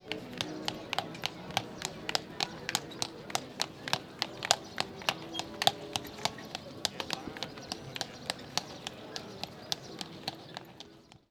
Clatter Of Hooves

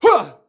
Male Attack Grunt

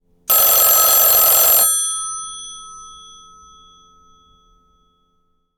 Rotary Phone Ring Loopable

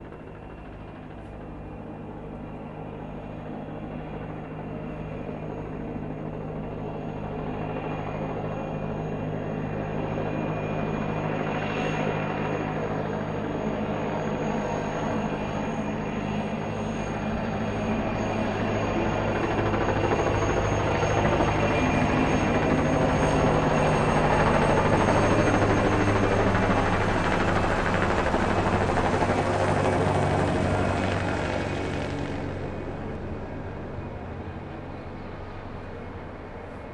A Helicopter Flying Low Above The Ground

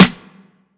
Rugged snares

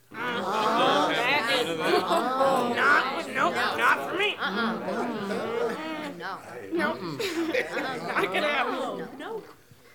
Boo Disapproval

